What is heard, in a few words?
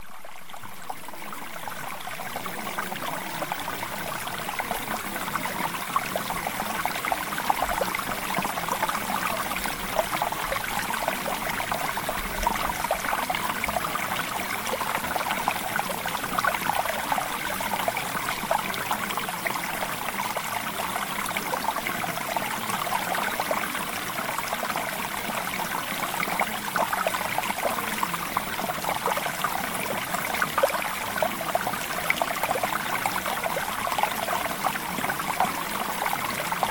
Mountain Stream; RELAXATION; Premium Subliminals; Emotional Mastery